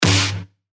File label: Snare; Forest; revealed claps snares vol 2